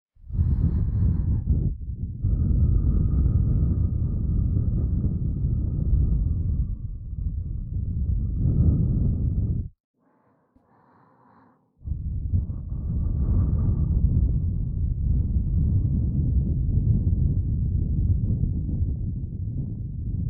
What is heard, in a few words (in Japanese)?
耳ふー両耳; 耳舐めコレクション